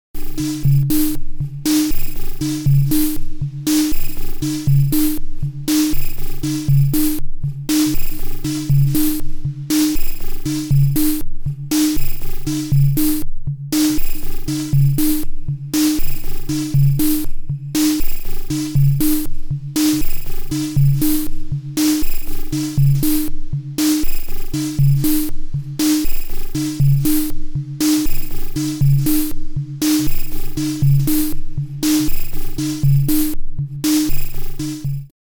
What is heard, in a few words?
sine; VCV